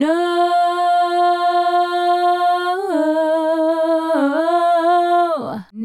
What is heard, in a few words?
Female Group, Hip-Hop and RnB Vocals